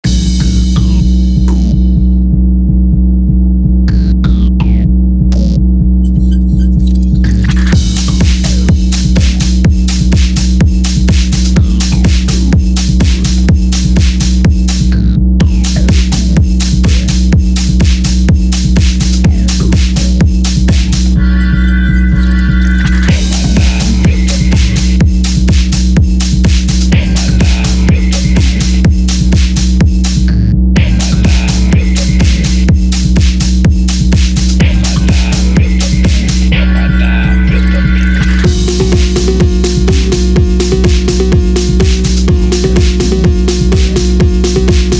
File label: Phonk Song